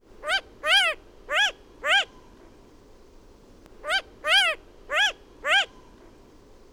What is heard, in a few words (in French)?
hibou moyen duc